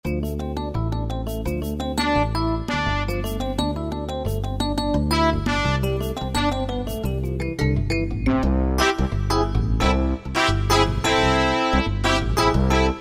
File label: Latin Band, Korg N364; Factory Combi demos